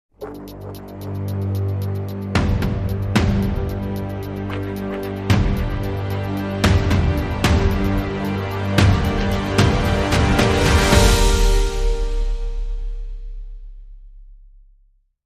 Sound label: Dramatic Sting